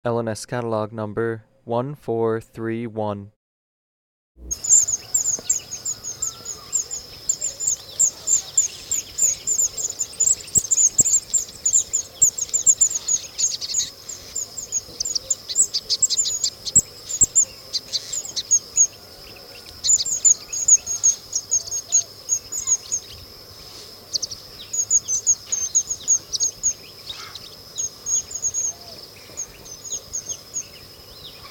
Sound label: african pygmy kingfisher